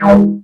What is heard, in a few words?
minimoog, techno acid